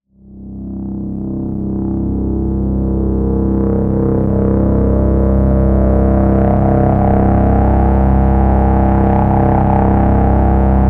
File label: MS-20 Noise Feedback Drone; MS-20 Patches